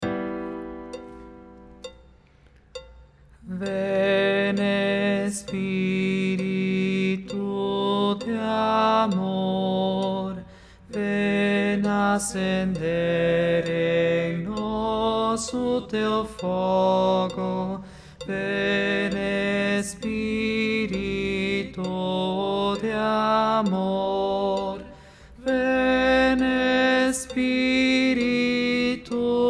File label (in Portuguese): tenor